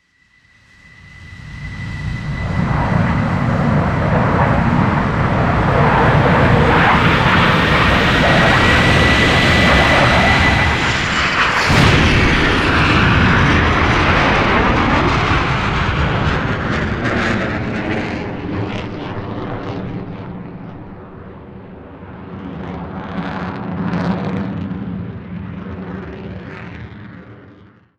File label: f15 jet; gulag; Call of Duty Modern Warfare